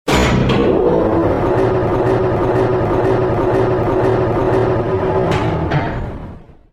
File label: hanger platform, movers